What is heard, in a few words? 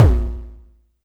BD